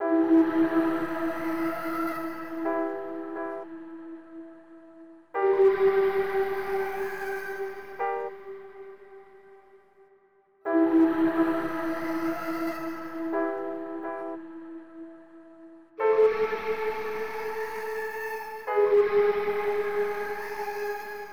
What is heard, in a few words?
Melody